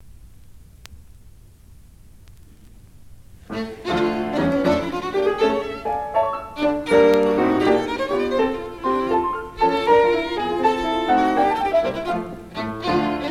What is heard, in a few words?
Sonata In A Minor For Violin And Piano; Op. 137, No. 2; Menuetto